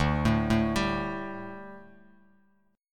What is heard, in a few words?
D Suspended 4th down Bass Standard; arpeggiated